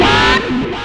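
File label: extra lead (bend splash); Sonic Foundry (Sony Creative Software); Ugly Remnants VOL-2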